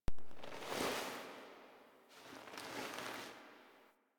Foley Turn Head; Warframe; The War Within

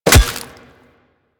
Melee Staff Impact Flesh; Warframe; Weapons; Staffs

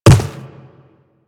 Quadruped Medium Walk Dirt, footsteps

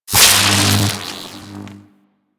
Electricity Hit Effect; Warframe

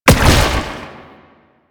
Proto Infested Brachiolyst Teleport Start; Warframe; Abilities

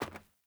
Sandals Land Metal, Footsteps; Characters; Player

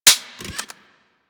Fire Mech, Rifles; Snipetron

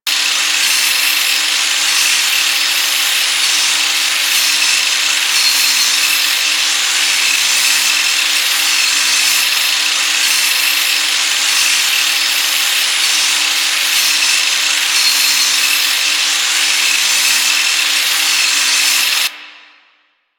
Saw Alt Projectile Loop, Weapons; Warframe; Tenno; Miter

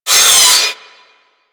Metal Impact, Weapons; Warframe; Tenno; Miter; Hit Effects

